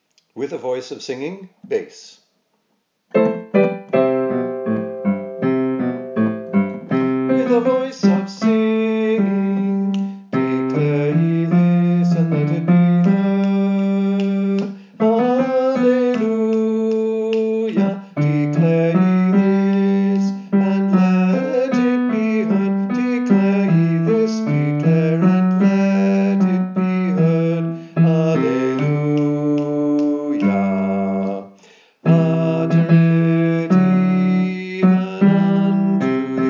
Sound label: Bass